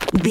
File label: footstep dirt loose walk run